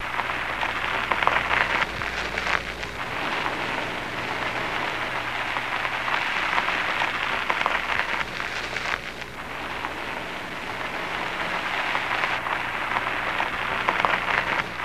Car Loop Of Slow Tires On Grit

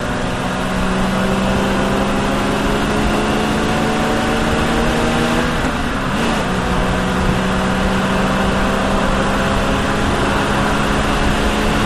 Mustang Accel OB Loop

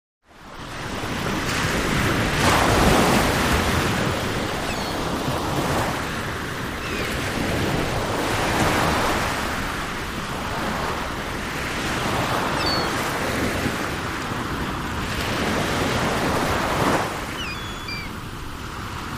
Ocean Ambience Seagu

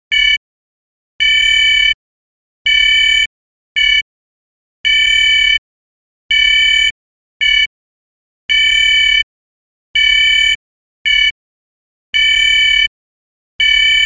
Bleeps Small Small El

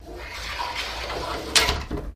Door Bi Fold Close

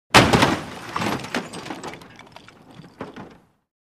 Vehicle Dropped On A

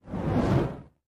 Torch Movement; torches

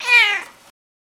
Cat Curt Meow

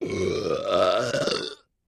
Med Belch, belching

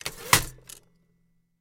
Typewriter Carriag; typewriters

